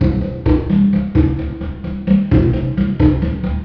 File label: bongo loop; unreal